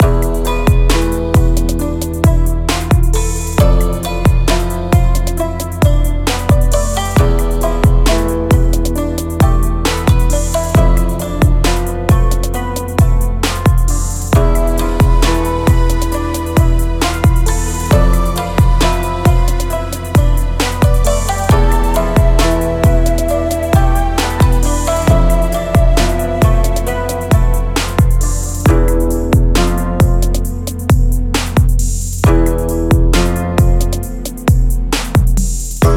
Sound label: Hiphop Melody Clean; Nyakuza; Empress